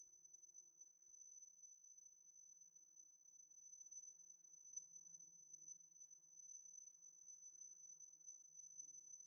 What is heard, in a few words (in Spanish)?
abejas; basel; choir of species